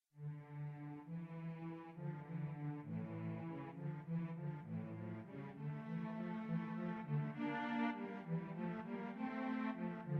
cello, cello duet

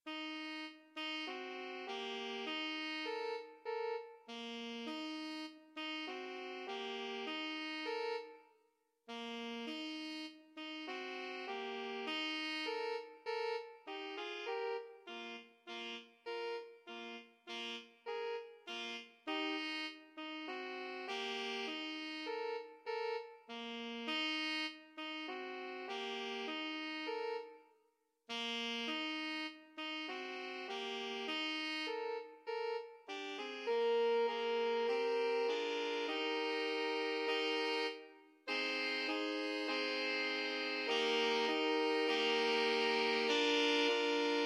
saxes quartet; saxophone quartet

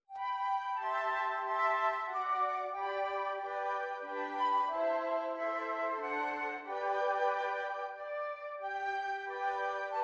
Flute; flute quartet

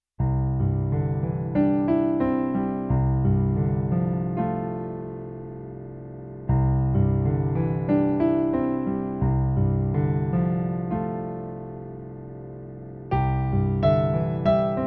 love at home piano duet; sacred; duets for students the sacred collection

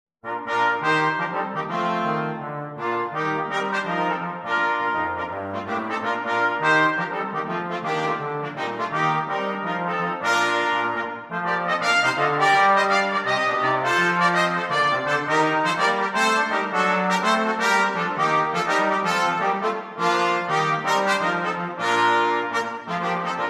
brass, jazz; Ding Dong merrily on high

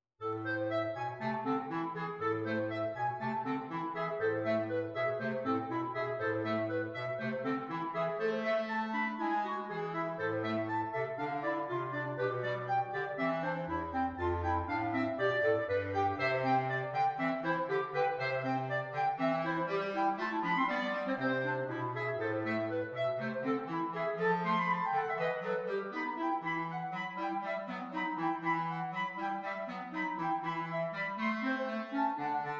sonata clarinet trio

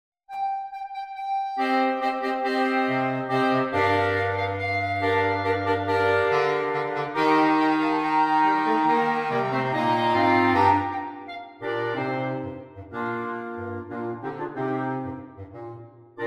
clarinet choir; drunken sailor

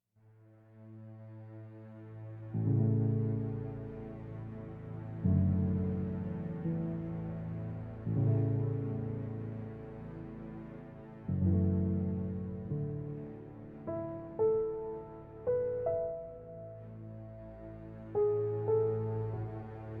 Solo Piano; movie theme

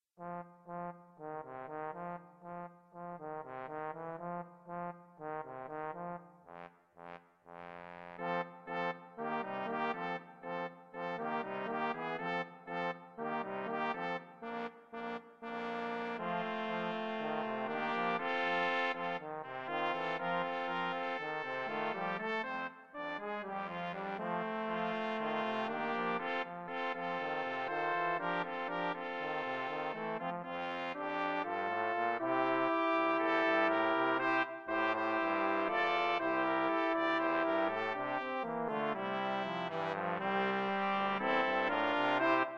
Brass, brass quartet

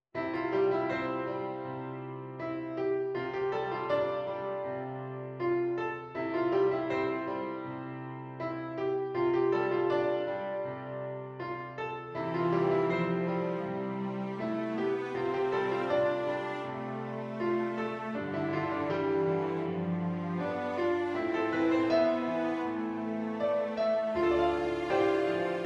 CELLO

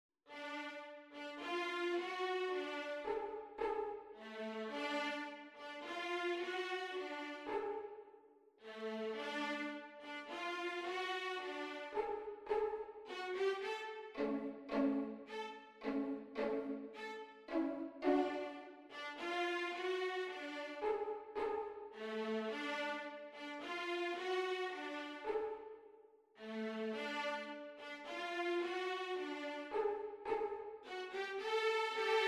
Violins Trio

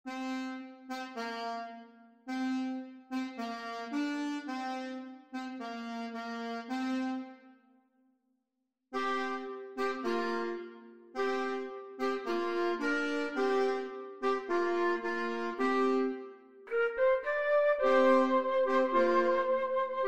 Flexible Brass Trio

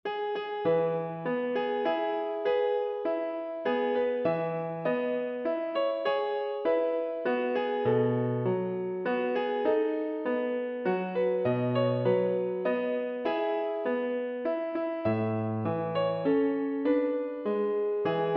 Lullaby, Piano; Johannes Brahms; Lullaby and Goodnight

